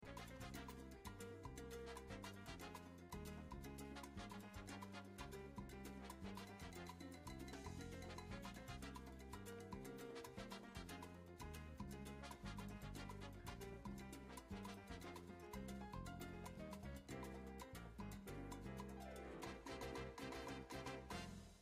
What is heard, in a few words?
short salsa sound only